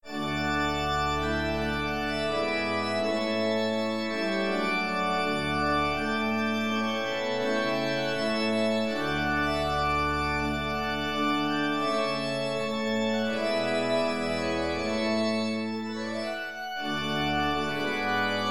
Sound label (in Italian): Sinfonia di chiesa